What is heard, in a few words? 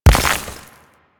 Step Surface Dirt, Mech; Step Surfaces; Nechro